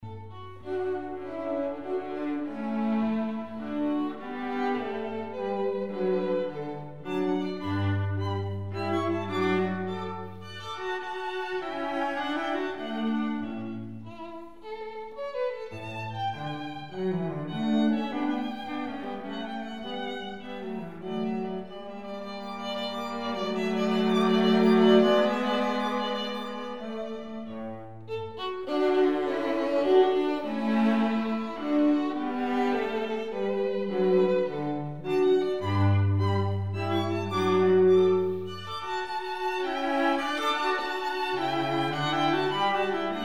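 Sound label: string trio; dittersdorf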